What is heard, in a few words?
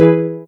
soft hitwhistle; osu